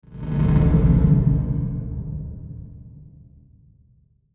SWELL CHOPPER, Swell Chopper Grind Dark Low Reverb; Production Element Imaging Element Accent Transition